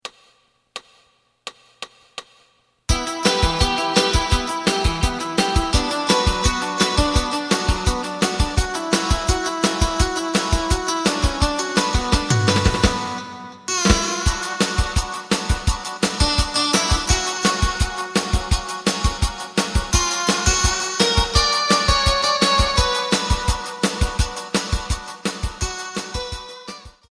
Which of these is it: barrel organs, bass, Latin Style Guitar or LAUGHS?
bass